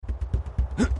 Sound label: jump sound